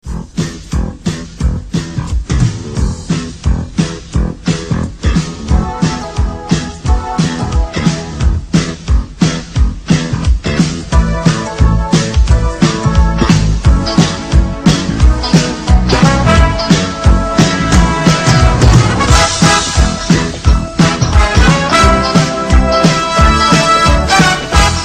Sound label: instrumental; DuckTales